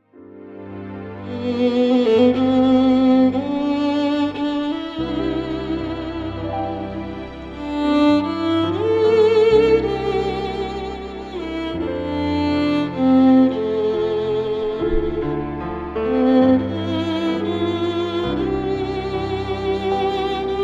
Danny Boy Keys and Violin; Cocktail and Dinner Music

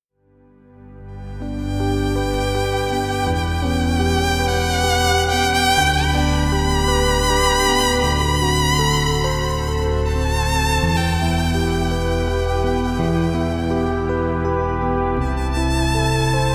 Keys and Violin; Ave Maria

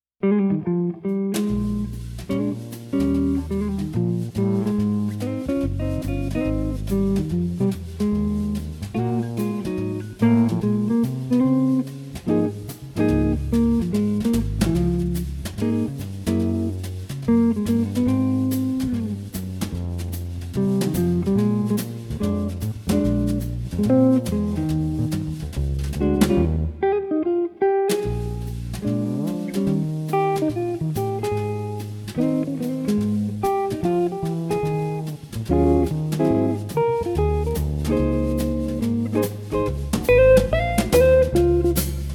Guitar Bass Drums Trio; Cocktail and Dinner Music

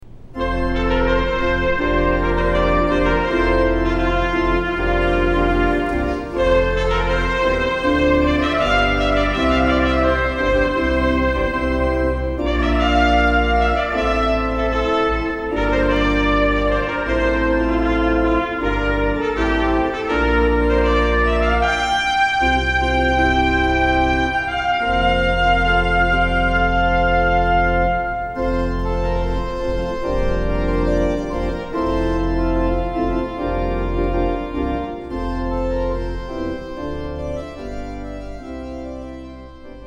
Trumpet and Organ; Wedding Ceremony Music; Festive Trumpet Tune

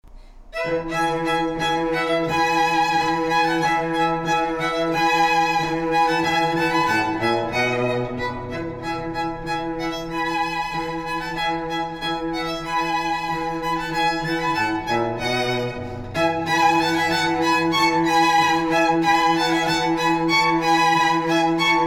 String Trio; Wedding Ceremony Music

